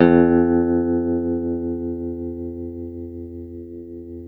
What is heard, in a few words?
TELE TREM F#2A, Guitars; Spectrasonics; Hans Zimmer Guitars VOL-1; TREMO-LUXURY